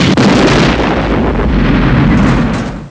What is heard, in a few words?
EXPLOS